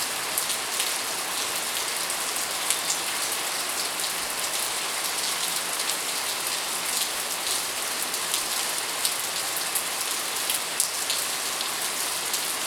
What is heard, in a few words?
rain